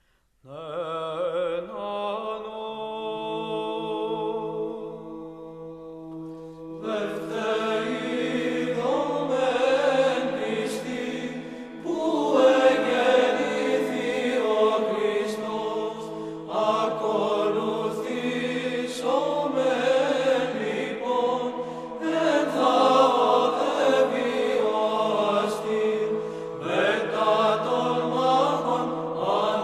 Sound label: Hymnes